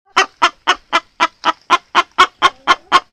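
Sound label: kokekkoo, rooster crow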